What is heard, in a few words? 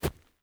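grass, steps; Terrarum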